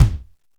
bass, AKAI XE-8; Greytsounds

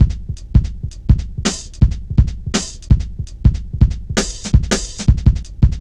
Drum Loops, Jungle

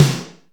Reverb Kick Snare; PS-37 Dance Synths; Percussion

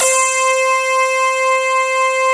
C5, TNT SYNTH; DYNAMITE

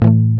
GUI A, Acoustic, Roland

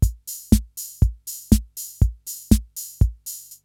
CR-8000 LOOP, DRUM LOOPS

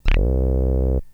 Synth hits and riffs

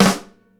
SNARE, Live Snares; E-MU Producer Series Vol. 8 – Platinum Phatt

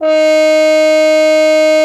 cup mute D#4; Xsample VOL-3

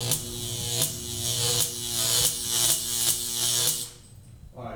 TESLA, Science Fiction; E-MU Producer Series Vol. 3 – Hollywood Sound Effects; Tesla 10 -19

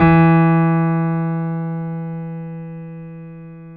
BOSENDORF

